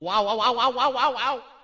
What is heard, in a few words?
ow wowowowowowow; Luigi